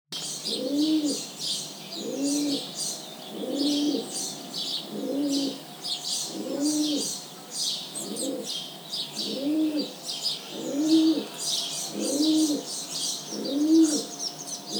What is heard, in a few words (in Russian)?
sizyy golub columba livia